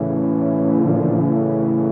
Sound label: house synth 125 A, keys